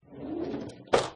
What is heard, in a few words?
Princess Room T Box Open